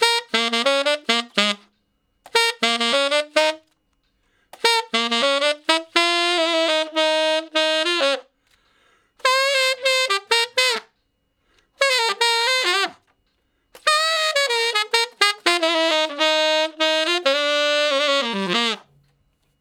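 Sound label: Sax Shuffle (Bb), tenor sax; Solos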